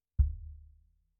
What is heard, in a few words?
Sub